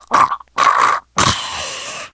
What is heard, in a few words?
death scream, survivor voice; source engine; left 4 dead 2; manager